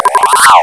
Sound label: Deedle; Short Circuit